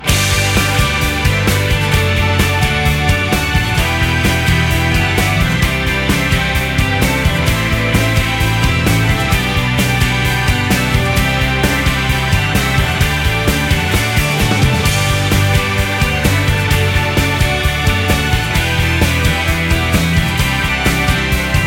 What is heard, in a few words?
bgm op; Bokuten: Why I Became an Angel